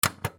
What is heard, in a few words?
button; ProjectZ; ButtonSounds